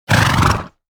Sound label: animal vocal vocal snort; smartsoundfx